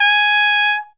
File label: T G# C, TRUMPET FX; Zero-G; Phantom Horns